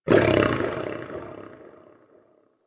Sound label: forest distant creature medium cry; Ambience; Warframe; Grineer Forest